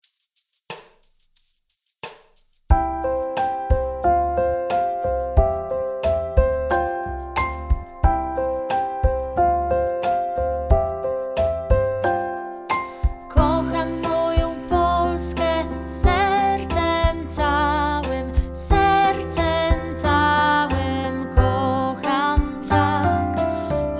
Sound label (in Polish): niepodleglosci dzien wok., utwór w wersji wokalnej